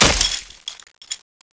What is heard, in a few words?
body zip drop